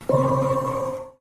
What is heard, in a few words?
Low Pitch